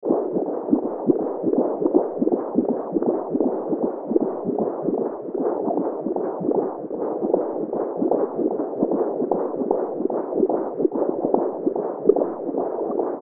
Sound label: Sonogram Baby Womb Heartbeat Medical; Hospital; Emergency